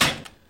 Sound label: Snare; 音MAD; 命运石之门